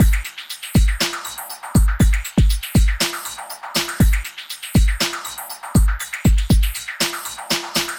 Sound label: Ambienza Beat loop 120